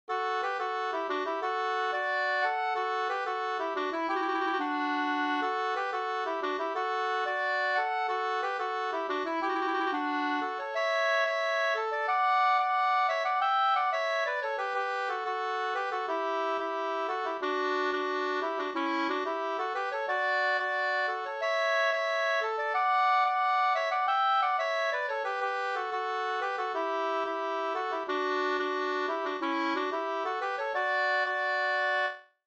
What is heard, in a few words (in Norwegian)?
pols; Finnskogspols Fanteladda no 2st